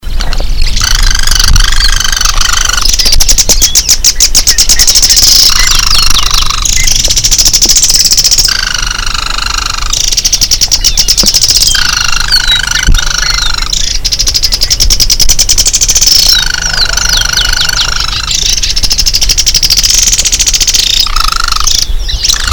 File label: Limnoctites rectirostris - Pajonalera pico recto